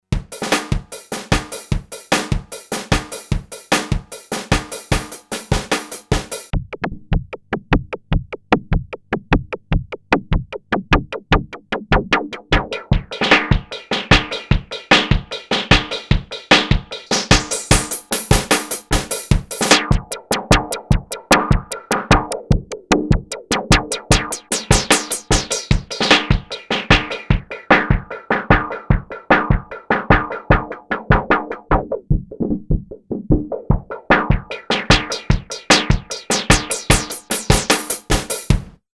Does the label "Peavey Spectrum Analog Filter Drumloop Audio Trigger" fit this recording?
Yes